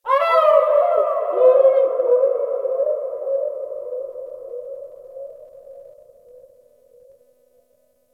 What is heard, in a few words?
Ocean Underwater Creatures Medium; Warframe; Grineer Ocean